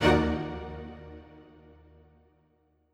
Strings Hit Spiccato

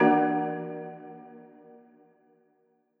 Marimba Hit

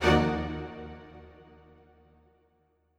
Strings Hit Staccato

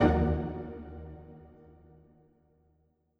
Strings Hit Pizzicato

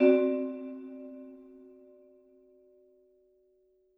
Vibraphone Hit

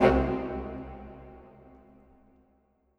Woods Low Hit

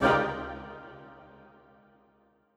Woodwind Ensemble Hit

